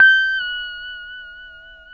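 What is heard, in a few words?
pedal steel bend down G6, Right; PEDSTL BENDS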